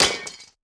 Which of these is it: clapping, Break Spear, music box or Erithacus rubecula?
Break Spear